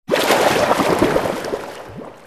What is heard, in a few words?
Liqiud